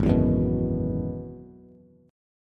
Ab Minor 7th Sharp 5th Ab, down, Bass; strummed; JGuitar

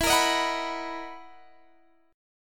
B Major 7th E; BM7/E; strummed

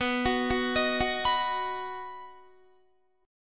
B Major, Guitar, 4F,4A,5C,5G,5C,6E; arpeggiated; 18,21,18,20,18,19

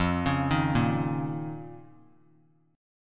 F 7th Suspended 4th Sharp 5th F down; F7sus4#5; arpeggiated; JGuitar

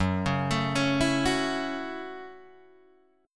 F sharp Minor 7th F sharp, Guitar DADGAD

